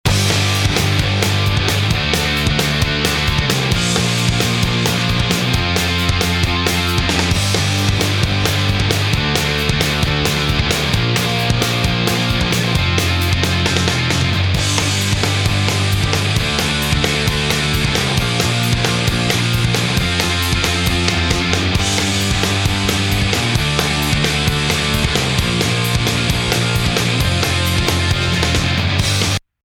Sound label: Punk